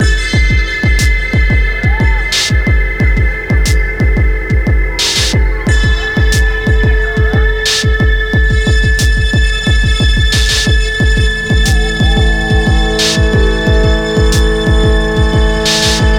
Valley Music Loading; Battlefield 3

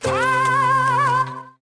fly; lego my style preschool

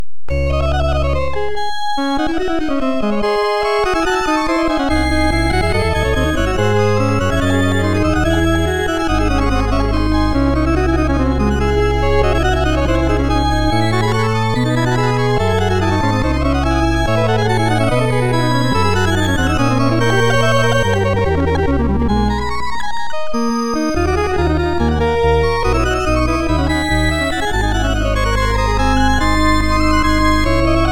BGM (Fast); Block Block; arcade

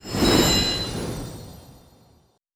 ultimate water; Idle Wizard

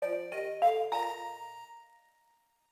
Stage Unlock BPM200; Glitch Busters Stuck on You

